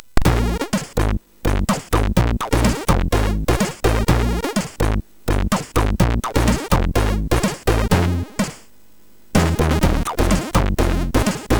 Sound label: Disco, MOS6581; MOS6581R4